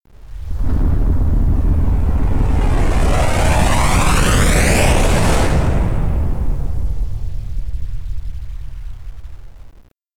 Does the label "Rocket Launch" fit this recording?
Yes